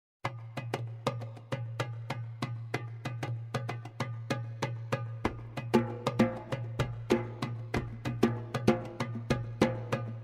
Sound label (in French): chant tutti; Bonse Aba